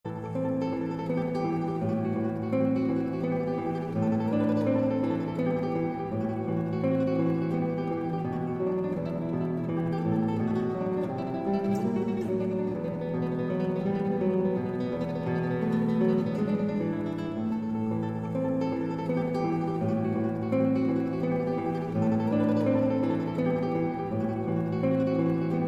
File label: Tremolo, guitar